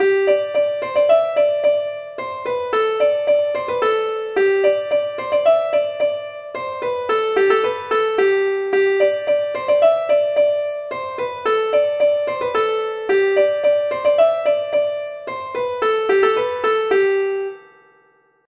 suite polkas

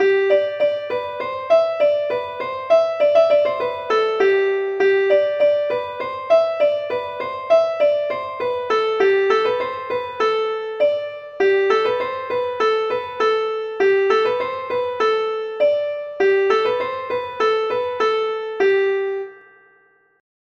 suite passes pieds

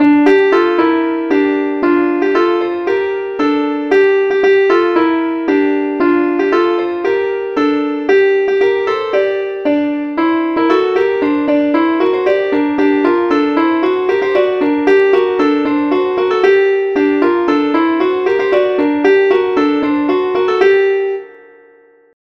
suite ronds

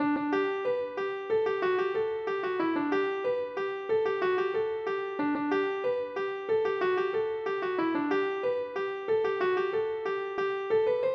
ridee; valet

